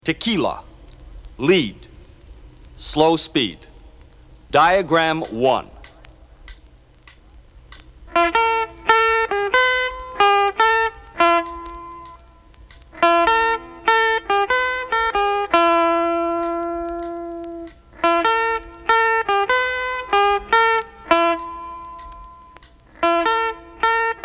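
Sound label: lead, guitar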